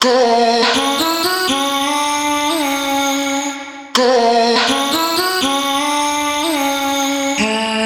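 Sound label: Girl, vocal chop, 122 bpm, F#m; Slate Digital Omnivox Vocal Sample Pack; Chops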